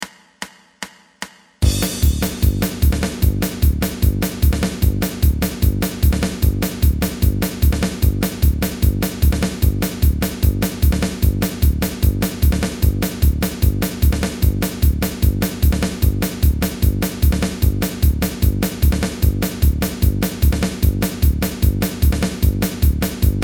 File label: full speed 150 loop; emir hot; lick of the week no 13